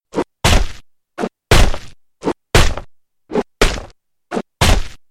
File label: attack; Sand King